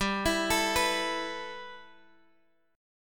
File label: G 6th Add 9th G Guitar DADGAD, chord